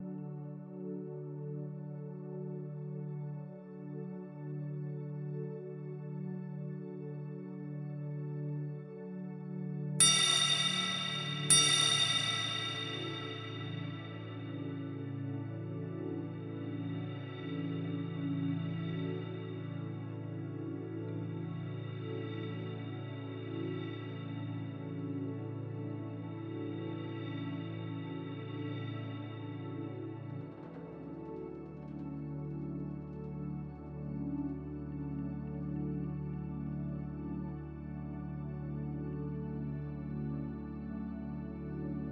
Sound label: relax reiki music 3 minute timer 3 minute bells